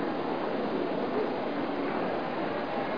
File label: people; deadlock